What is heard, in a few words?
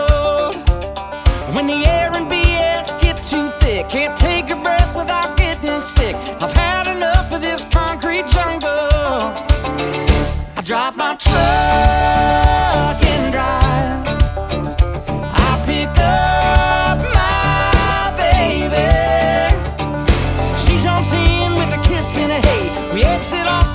banjo flinger